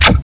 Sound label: gauss fire